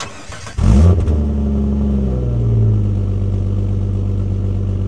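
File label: Truck Cherokee Start Idle Loop; SFX Kit; Trucks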